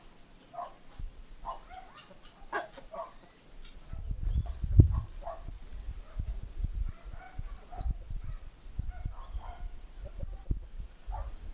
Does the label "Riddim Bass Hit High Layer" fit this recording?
No